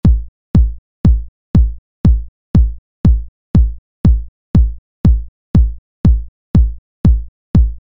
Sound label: 909 DRY